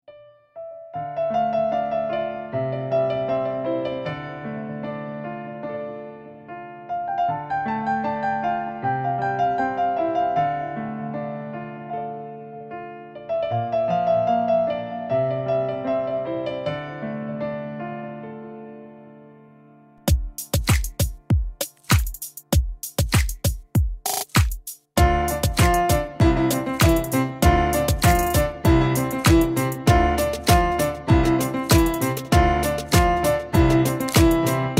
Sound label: piano